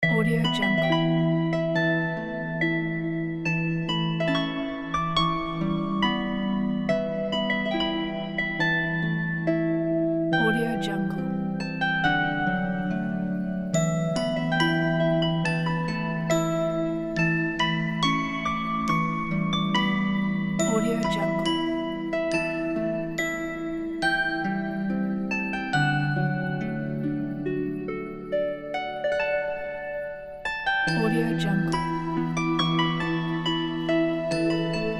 fantasy forest; song of moonchild

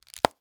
cd wallet close

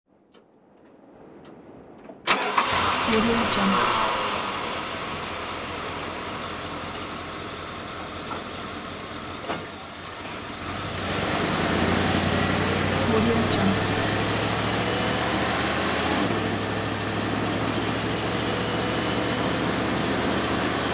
dodge ram charger truck suv start idle

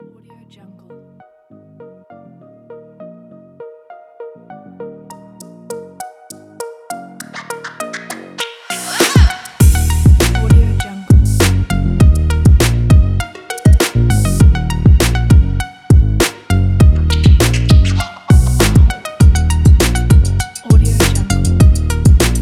hip hop

